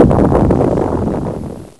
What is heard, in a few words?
Shatter Stone; Death Blade